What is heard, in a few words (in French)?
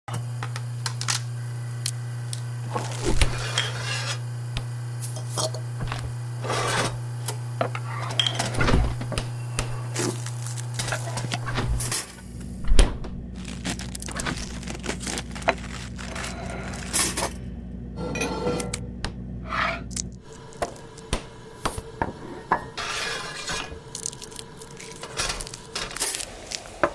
cuisine effet sonore